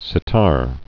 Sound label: sitar